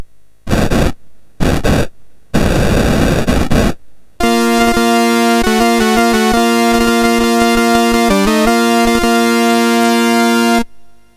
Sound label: Fanfare, MOS6581; MOS6581R4; Nosfera II